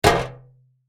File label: Impact Metal Bang Dull; Materials